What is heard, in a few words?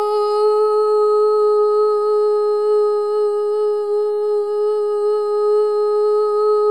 SOLO BOY, OH; Spectrasonics; Symphony of Voices